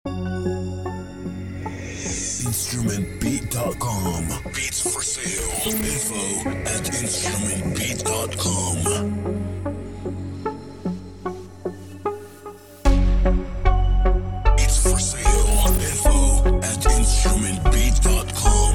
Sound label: Beat Trap